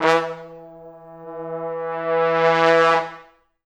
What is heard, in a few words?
E2, Pop Brass